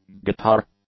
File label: guitar; nouns